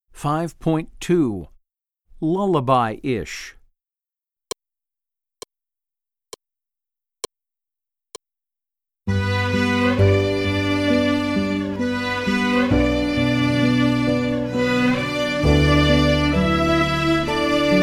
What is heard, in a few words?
Lullaby ish